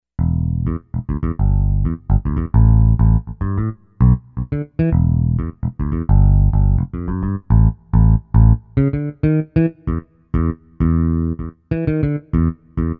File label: B Bass